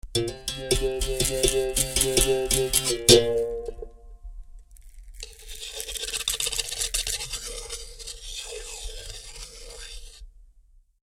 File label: berimbau